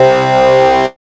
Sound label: hard C3; dubstep; Jack Sparrow; Deep Dubstep Progressions